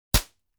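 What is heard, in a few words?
diode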